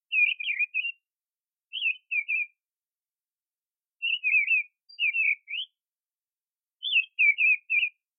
misteldrossel; Turdus viscivorus; Drosseln

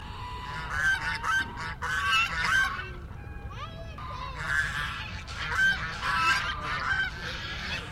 schwanengans

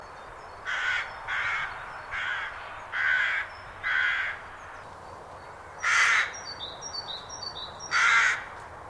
saatkraehe

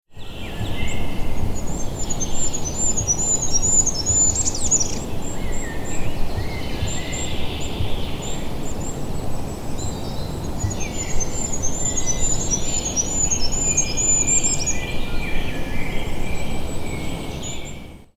wintergoldhaehnchen

